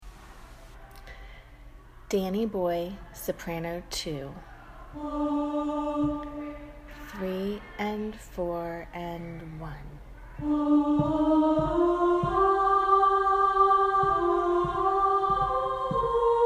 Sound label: soprano; danny boy